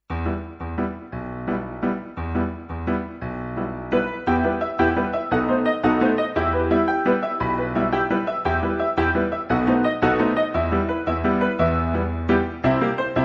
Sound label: swing